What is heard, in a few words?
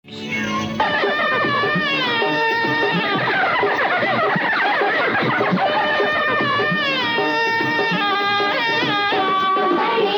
jingle; todi